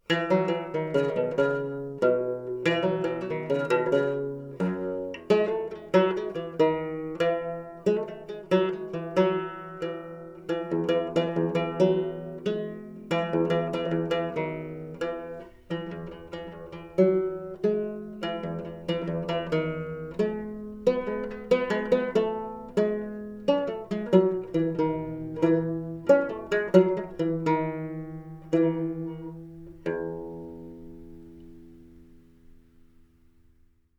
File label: fretless